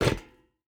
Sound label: footstep rubber boots metal jump land; Rust